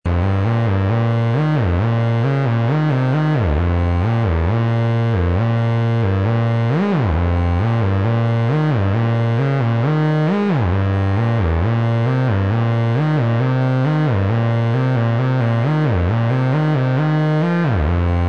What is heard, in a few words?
divider and psuedo sequencer; PSIM-1